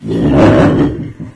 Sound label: hunter found enemy ack, ministrider